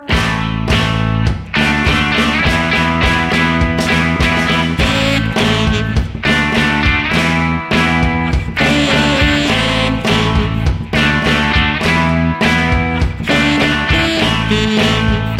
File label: rock method